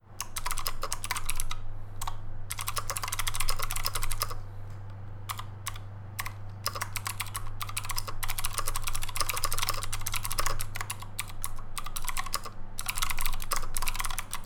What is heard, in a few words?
Epomaker AK84S Gateron Blues